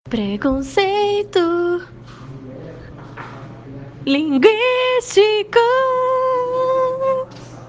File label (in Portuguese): vinheta; preconceito linguistico